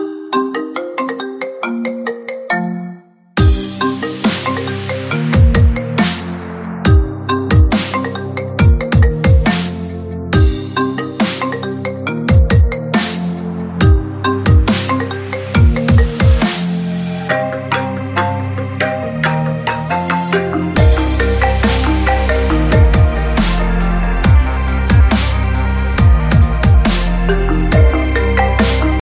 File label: iphone remix ringtone